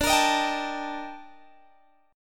Bb Major 13th D

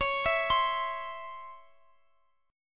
Db Minor Major 7th Db down Guitar Drop D

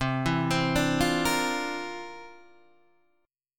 C 11th, C, down, Guitar, DADGAD

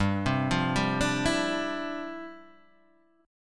G 13th Suspended 4th, G13sus chord; G13sus arpeggiated

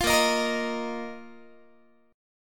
A sharp Suspended 4th Guitar; A#sus4 strummed; 20,18,18,20,19,x

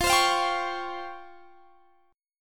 Db Major Sharp 11th F

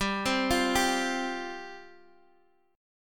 G 7th Suspended 4th G down Guitar Half Step Down

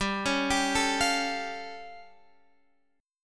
A Augmented 7th G

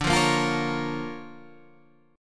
D Minor Major 7th Sharp 5th, D

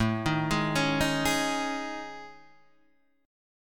A Major 7th Flat 5th, guitar; AM7b5 Chord {5 6 6 6 4 4} arpeggiated

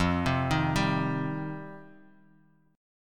F 7th Suspended 2nd F down Guitar Half Step Down

